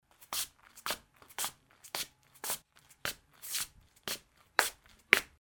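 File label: shuffling sound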